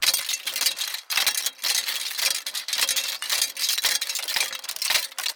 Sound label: armor work, battle